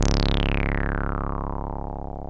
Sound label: TB303; E-MU Formula 4000 Series Vol. 2